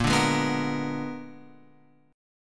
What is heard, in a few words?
A sharp 7th Flat 9th A sharp down Guitar Open F; A#7b9 strummed; JGuitar